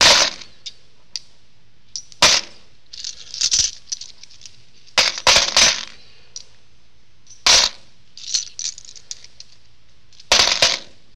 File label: Coins On Coins